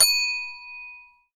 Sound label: Ding; El Chavo: Una Feria en la Vecindad